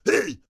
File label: Pirate Barrel